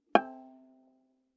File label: Viola pizz ff sul G G5; MIS Pitches - 2014; Strings